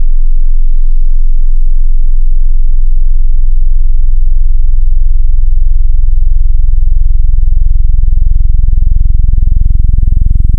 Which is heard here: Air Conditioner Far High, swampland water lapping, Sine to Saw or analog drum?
Sine to Saw